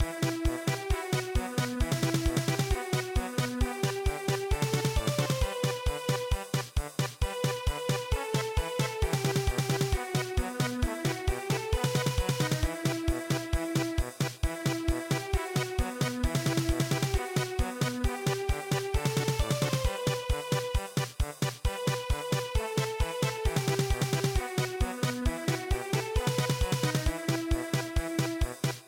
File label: Cossack Lullaby German Polka